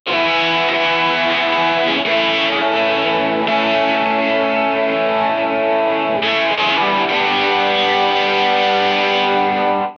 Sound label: electric guitar chord